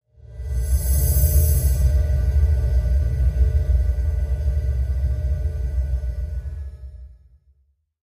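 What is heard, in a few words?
Stab Electronic Drone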